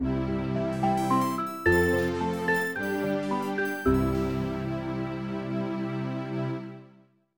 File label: Sting